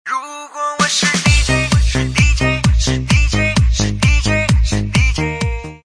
收音机播放的音乐

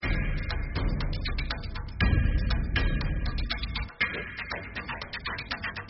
关卡背景音乐

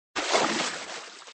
钓鱼鱼饵入水

